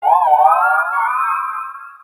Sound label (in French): Cri; Sylveroy